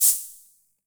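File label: Olson Cym A, cymbal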